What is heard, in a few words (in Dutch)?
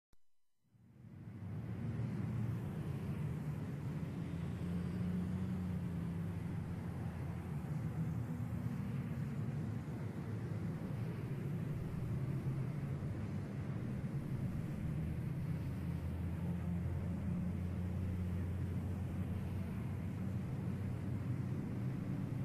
snelweg